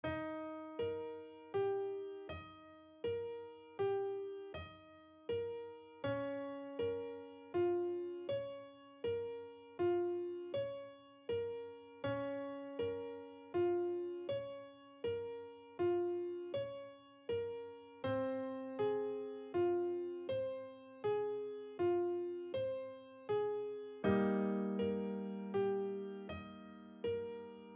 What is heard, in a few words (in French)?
Piano Débutant 40bpm